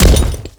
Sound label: Ghoul Devourer Step Default, Footsteps